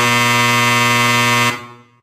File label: door buzzer